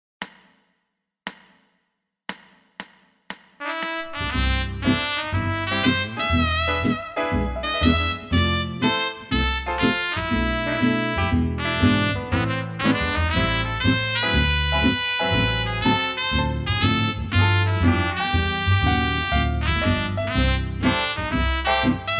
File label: trumpet